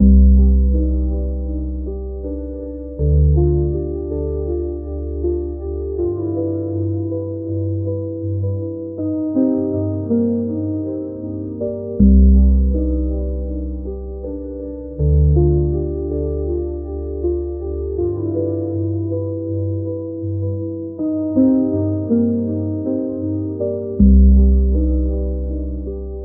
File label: soft ambient pads mellow